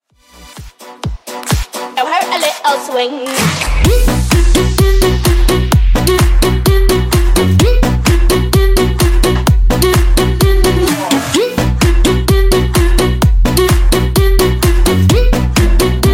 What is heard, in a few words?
Top40 Extend 128bpm